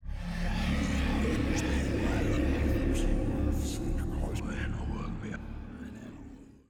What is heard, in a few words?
ghost whisper